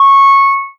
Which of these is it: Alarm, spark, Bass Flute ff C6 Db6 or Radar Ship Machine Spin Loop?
Alarm